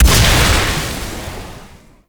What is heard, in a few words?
Projectile Death Large; Weapons; Warframe; Duviri; Incarnon Adapters